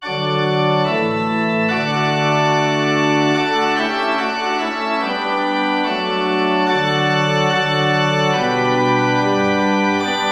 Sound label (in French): quand sonnent les cloches